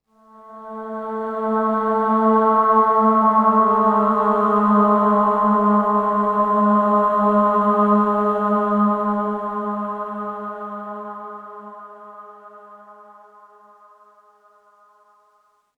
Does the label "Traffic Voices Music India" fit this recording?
No